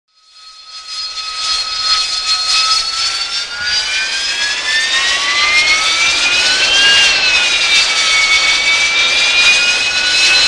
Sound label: Harrier Jump Jet Starting Up, Then Increasing Engines And Taking Off.; Start / Take Off; av-8b harrier